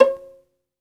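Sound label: solo violin, strings; ILIO; Synclavier Strings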